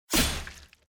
cut

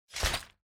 UI Switch Equip

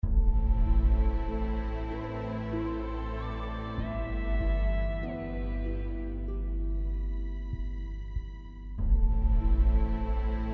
Battle Failure

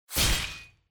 puncture

